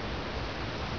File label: wind